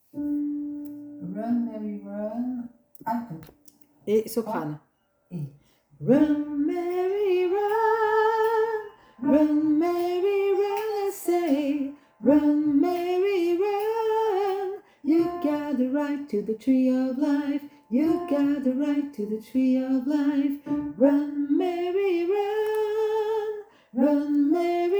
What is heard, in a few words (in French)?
voix soprano fond alto